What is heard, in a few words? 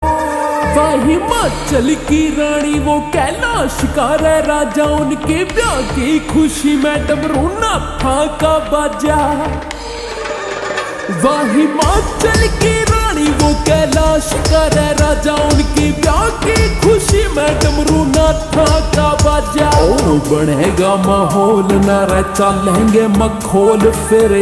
Slow Reverb Version Ringtone